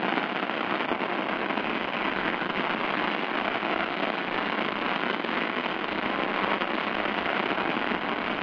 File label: flare; breeze